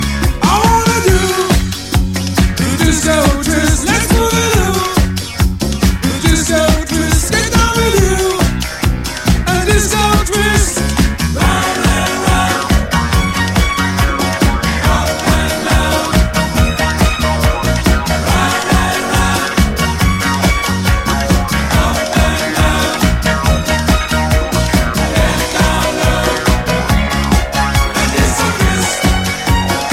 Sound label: the disco twist